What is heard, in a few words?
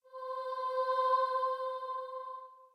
starting note, voice; victorious conqueror